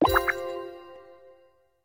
Characters Upgrade; hello kitty friends match; android

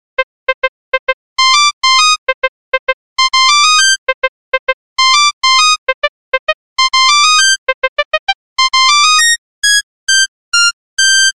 Ring Tone (Mono); Smeshariki Kaleidoscope of Games

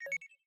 Hub-Train Screen-Ui

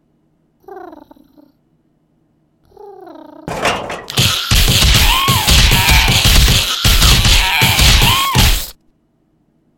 Crowbar

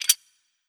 Metal; Legend of Keepers

